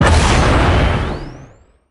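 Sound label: power portal; smite; heimdallr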